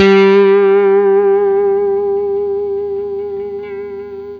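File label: blues long vib, Guitar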